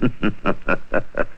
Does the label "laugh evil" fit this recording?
Yes